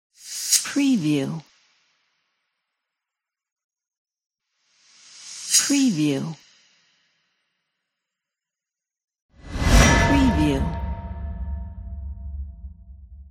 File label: swoosh transition wooshes